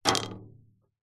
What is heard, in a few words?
stone pebble drop against metal tank and land on concrete slab